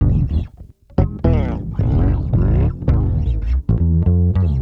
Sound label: Bass Loop, Ambient Grooves; Sonic Foundry (Sony Creative Software)